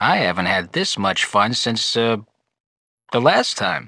haha; NWNDS; Morte

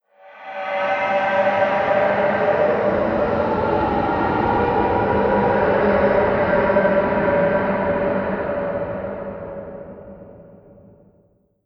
guard hit, creature; GammaAge

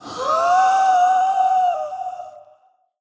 bat; creature; GammaAge

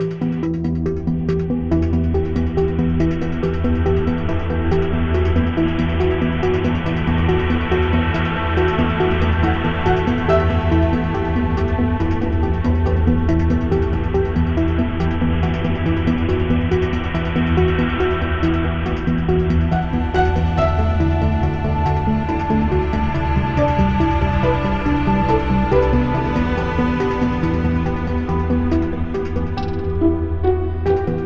Ambient Recollect; NWNDS; Ambient music pack

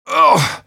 hit; NWNDS; nwnds audio

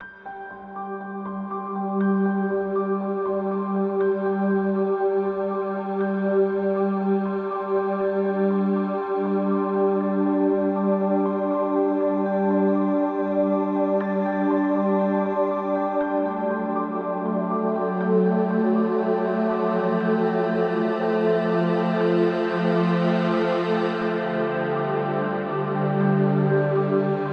Ambient Exploration; Music; Intensity 1; NWNDS; Ambient music pack

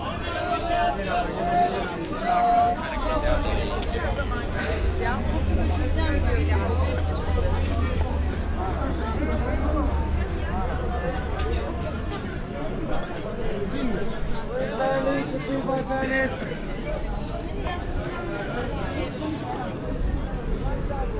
bazar walla

